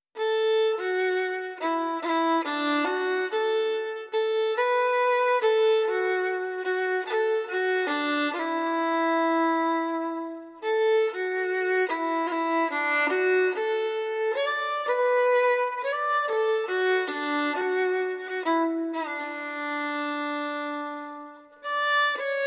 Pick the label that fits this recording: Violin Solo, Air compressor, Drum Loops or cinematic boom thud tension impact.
Violin Solo